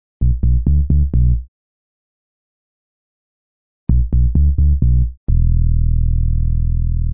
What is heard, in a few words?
simple dubby bass synth